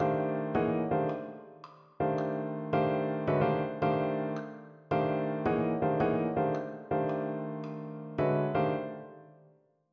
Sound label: jazz comping techniques, piano